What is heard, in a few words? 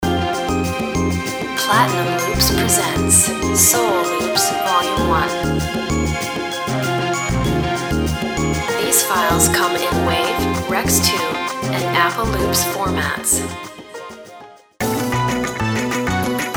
soul loops; retro Soul Loops